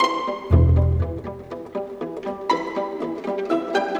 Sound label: Strings Pizz, Cinematic; Sonic Foundry (Sony Creative Software); Orchestral Series VOL-3